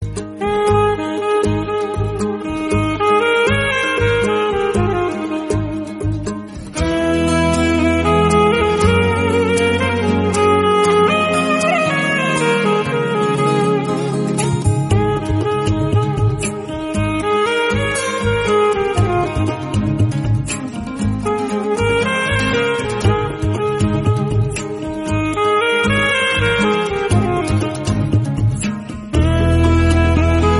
Instrumental

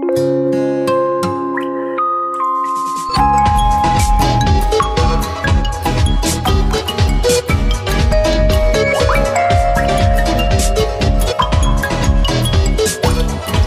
Vivo V3 Max

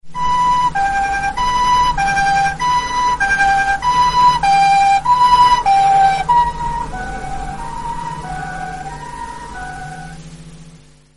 Ambulance Siren

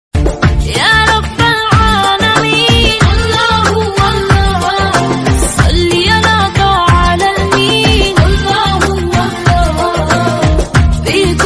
Ringtone Female

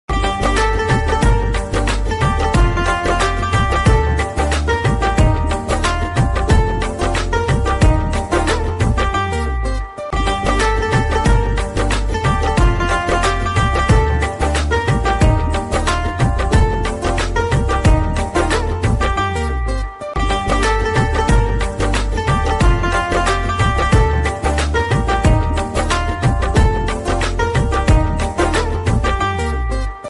Instrumental

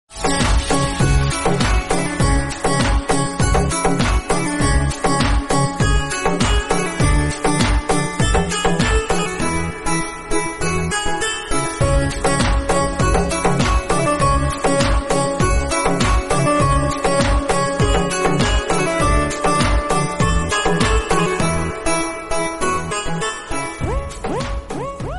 Romantic Bgm

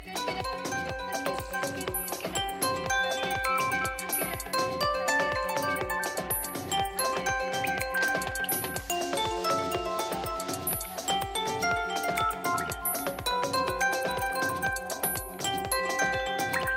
Vivo Y50 T, ringtone

